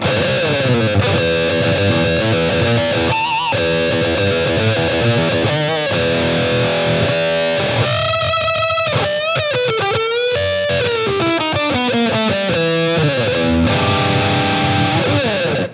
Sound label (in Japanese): wah tone Range 11時